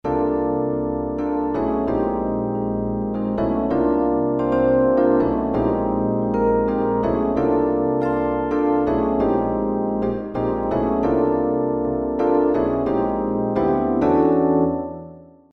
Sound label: piano reverb, yamaha tg33